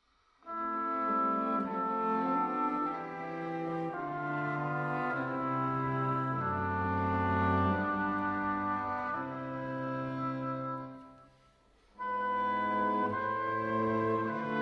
St. Matthew Passion, Wenn ich einmal s, Arr. for Oboe Quartet